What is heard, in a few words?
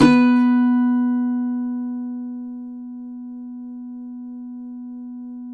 cuatro; Spectrasonics; Hans Zimmer Guitars VOL-2